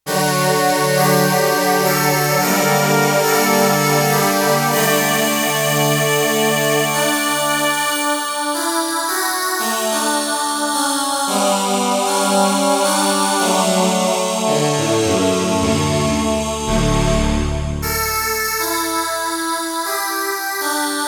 Girlish Vox, Roland JD-800; Factory Patches

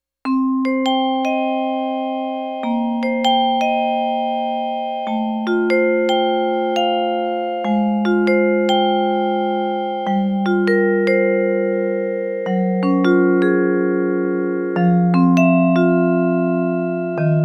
Vibraphone

